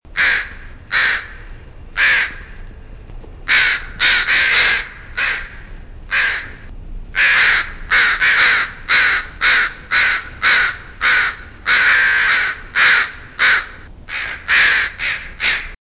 Northwestern Crow

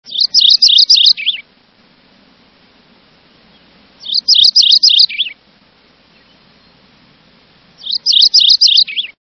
Mourning Warbler, songs

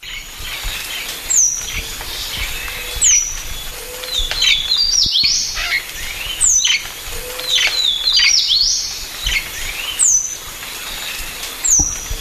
Fan tailed Warbler

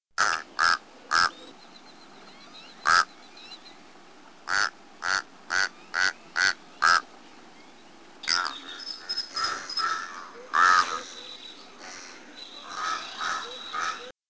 Tamaulipas Crow, songs

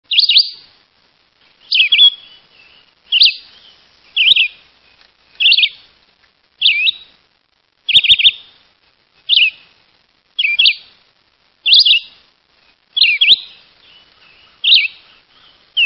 Philadelphia Vireo

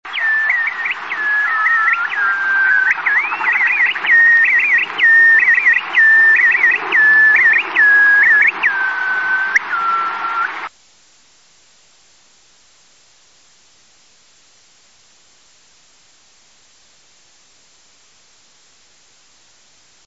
Bristle thighed Curlew

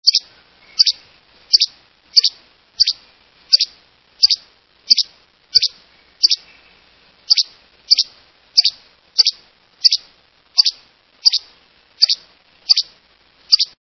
Least Flycatcher

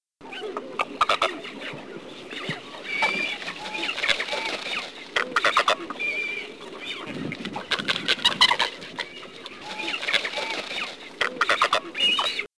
Great Cormorant

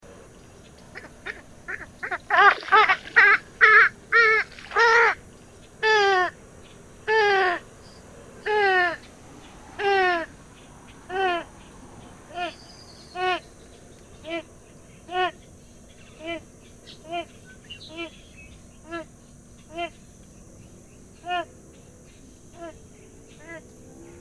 Purple Swamphen

